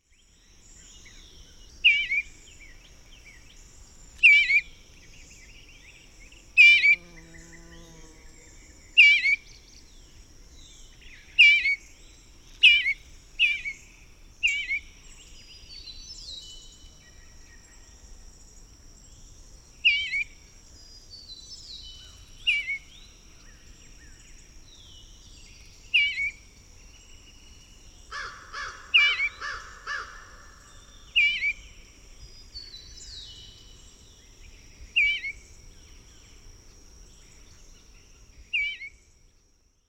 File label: bluebird calls